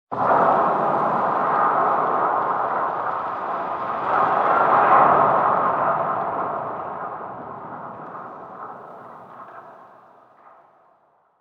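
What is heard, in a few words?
mega shipyard metal ambience; Stellaris; federations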